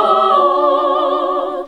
SING, CLASSIC VOX1; Best Service; Hallelujah

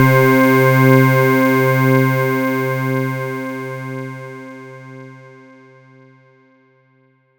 pad C3, PPG Wave 2.2; Pads

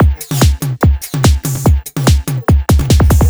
145 Undercore Fill, Drum Loops 145bpm; Zero-G; Soundclash Nu Dance Electro; Acidized Wavs

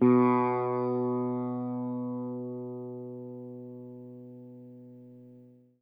EL SITAR SFT; Quantum Leap - Guitar & Bass

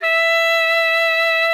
E5, SAXOPHONE; Session Instruments; Best Service ProSamples vol.42

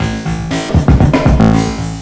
FXBEAT

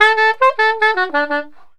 SAX, Balkanish Traditions

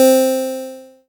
ELKA, String Machines; ELKA RHAP

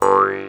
MT G, JHARP G B; Xsample VOL-9

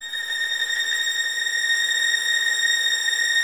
VS A#6 Tremolo, 11 Violins; Miroslav Vitous

